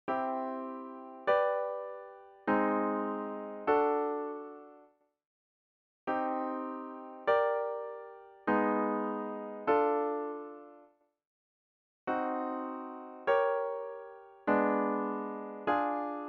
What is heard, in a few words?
triad qualities